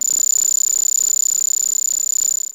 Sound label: lock